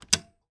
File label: breaker switch off